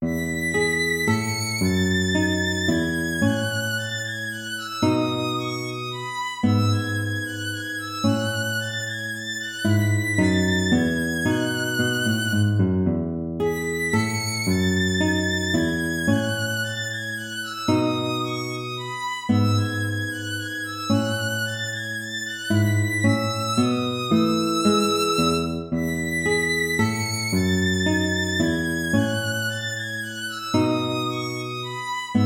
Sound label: minuet major